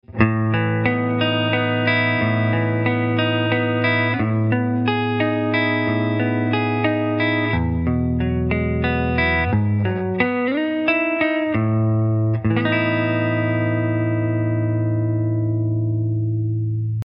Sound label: Stealth Electric